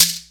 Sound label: CHEK, ETHNIC PERCUSSION; AKAI S6000 CD-ROM - Volume 5